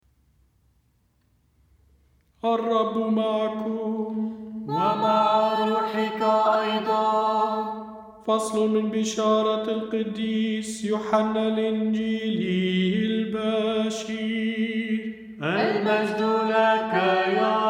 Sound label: Gospel Melody; ألحان الإنجيل